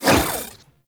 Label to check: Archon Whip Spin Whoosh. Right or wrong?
Right